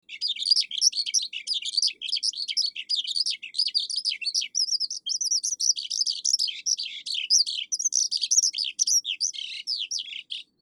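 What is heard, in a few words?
skylark song, birds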